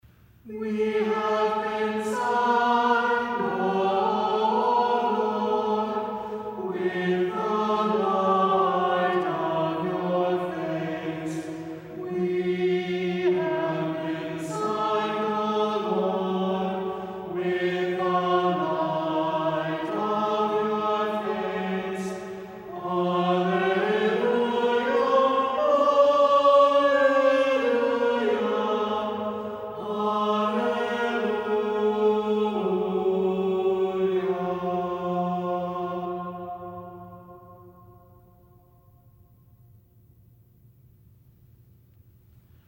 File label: Communion Hymn; Great Fast Third Sunday